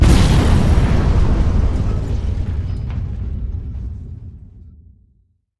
chopper last explosion; Blind Drive

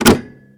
Auto Lever Brake Release; Automobiles

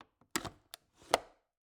Cabin, window, latch, flip, lock, open; Eiravaein Works; Vaeyan IV

